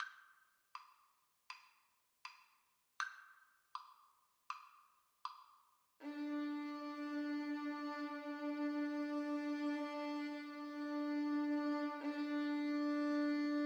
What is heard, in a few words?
Violin Duet